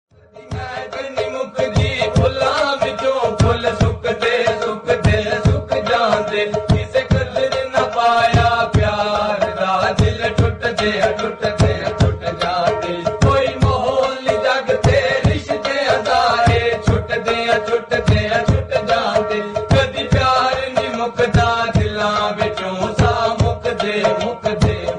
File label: islamic song